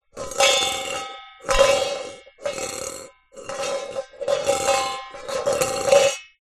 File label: foley metal bowl shake movement plastic object inside rattle move